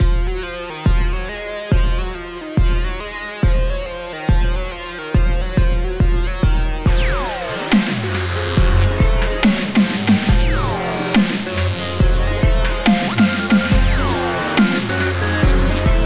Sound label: theme dubstep remix